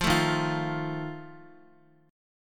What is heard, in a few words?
Eb Suspended 2nd Flat 5th, Guitar; strummed; chord; x,x,3,2,3,0; JGuitar